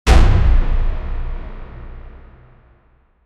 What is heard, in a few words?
dramatic trailer bed